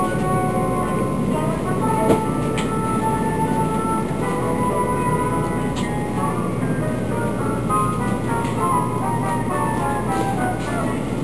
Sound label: ELEVATOR